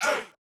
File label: Closed Hats